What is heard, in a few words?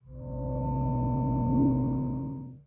bass resyn